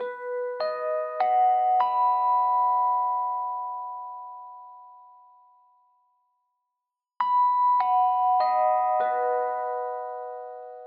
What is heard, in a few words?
Announcement chime, Beeps; SFX